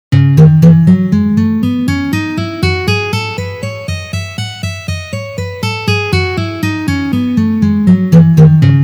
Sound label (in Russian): Gamma Mi mazhor Tri noty pozitsiya